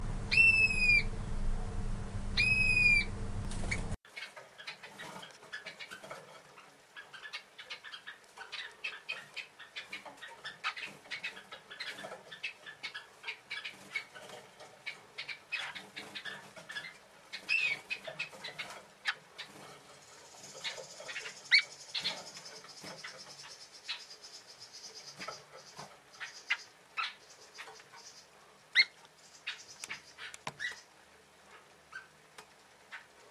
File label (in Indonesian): suara shafttailfinch